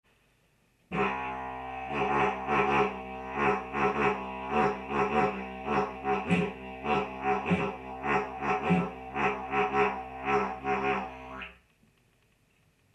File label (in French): rythme, Rythmer le son avec son diaphragme, didgeridoo; Faites le bourdon de base et toussez dans votre didj